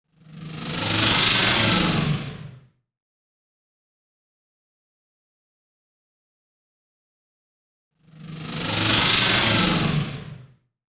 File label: 122 B#m Sfx, electro synths; loop; Prime Loops; Complex Electro Synths; Specters